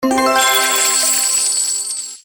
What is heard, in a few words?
bonus symbol; games; Billyonaire